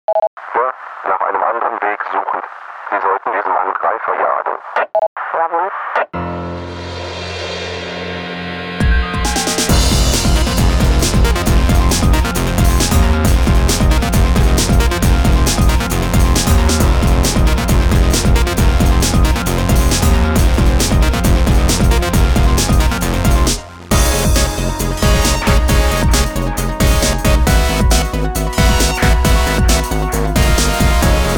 Farthest Wasteland; Under Defeat

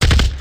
m60 burst; Age of War

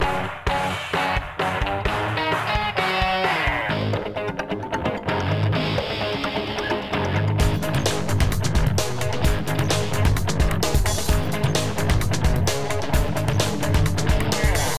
Action START; bolt 2008 pc gamerip

